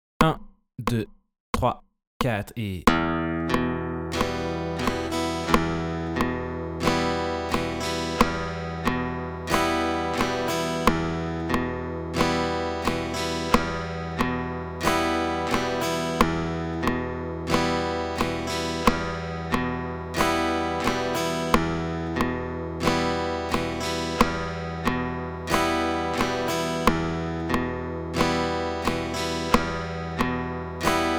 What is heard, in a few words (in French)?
Mélange basses et rythmiques 90